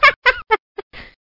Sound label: laugh